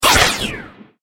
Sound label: Ultimate Sci-Fi Laser Blaster; Laser Gun Sound Effects; science fiction sound effect; star wars / trek style games